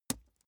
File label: wood impact small lash